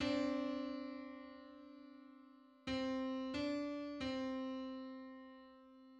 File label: Two-hundred-thirty-first subharmonic on C